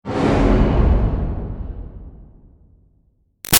Boom Bodyfall Car Reverb; Low Impacts; Big Room Sound

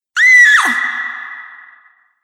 Female Scream With Reverb; Screaming

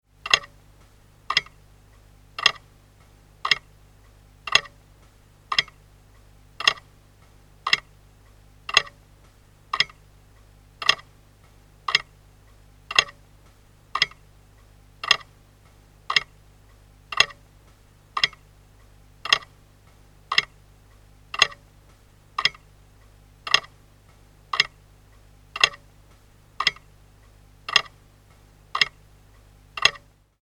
Clock Tick; Slow tick - 1840 post office wall clock; Clocks; Machines; Tom Hutchings

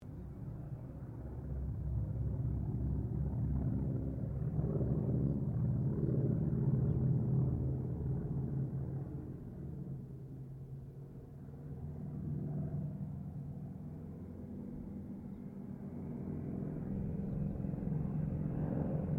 AIRCRAFT CERES WINJEEL WIRRAWAY PASS; Mixed aircraft squadrons; Airplanes (Sound Effects:Vehicles)

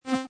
Tronic Rollover, Button sound or menu sound; Button Sound Effects; Multimedia

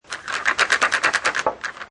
Billiard Pool Snooker Rack Up Balls In Frame; Pool balls, snooker balls, billiards balls

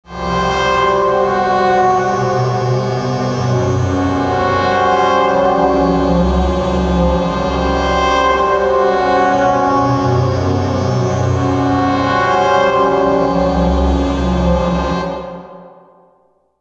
Musical Dark Ominous Buzz Swells; Musical Sound Design; Blastwave

